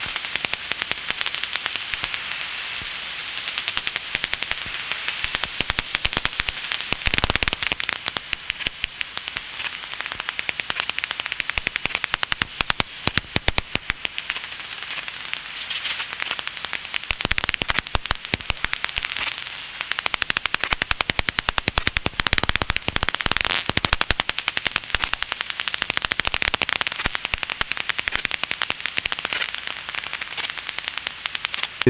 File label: whiskered, bats